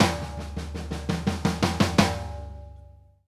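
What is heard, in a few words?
snare toms left, drum fills; Sampleheads - New York City Drumworks VOL-1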